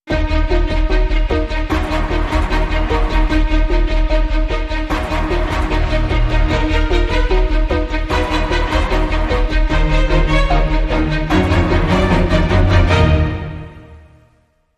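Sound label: Hybrid Sting, Orchestra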